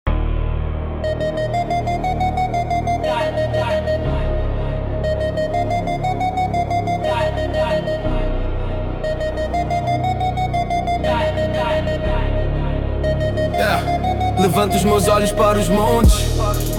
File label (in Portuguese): rap salmo